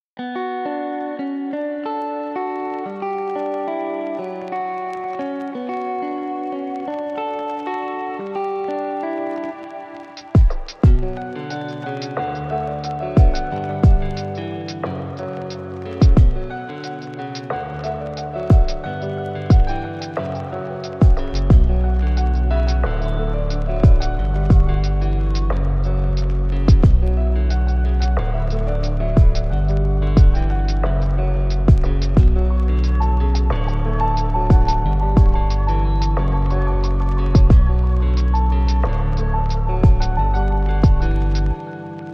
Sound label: instrumental lofi